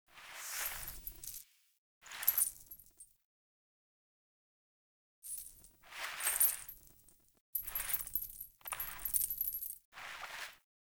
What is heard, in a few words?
Foley; Friendly; Verminia; Bromius Quest